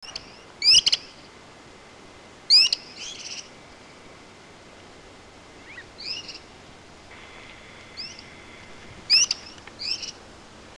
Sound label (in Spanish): remolineracastana; cantos